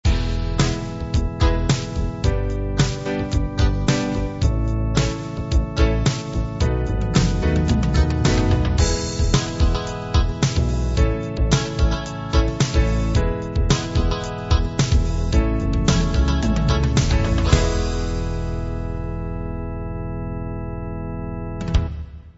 gospel rock

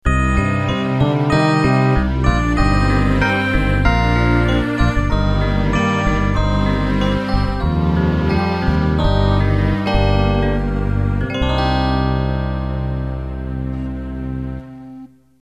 Animation End

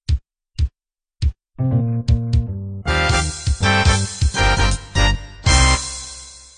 up swing end

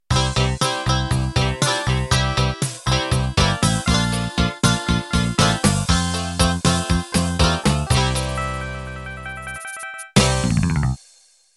Country Rock End

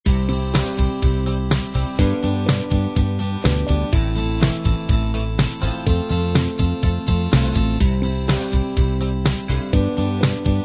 light rock

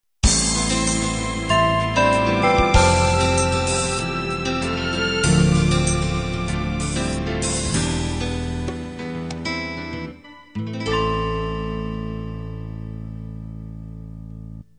guitar ballad end

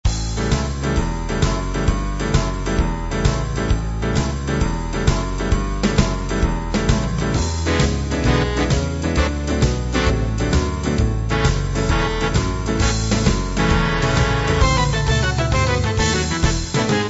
kansas city shuffle

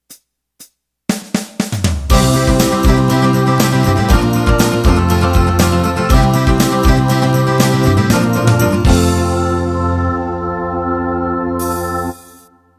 Country 16 Beat Intro

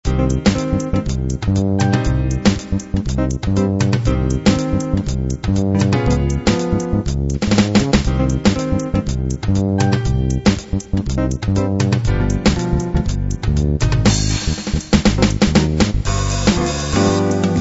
70 jazz

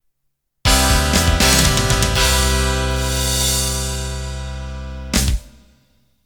Pop Rock End

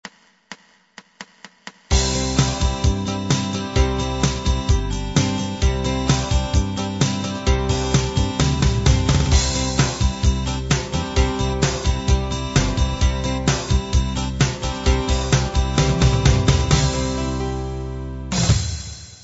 expanded rock

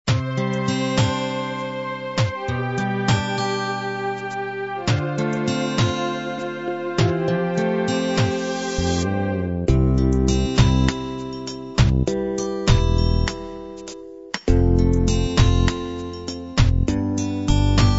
16 beat ballad combined

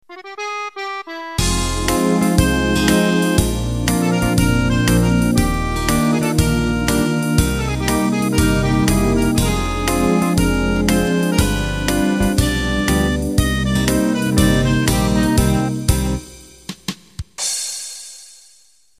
Euro 2 Beat Intro

